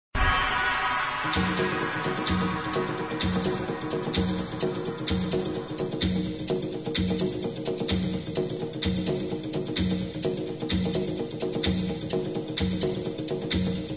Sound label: Tribal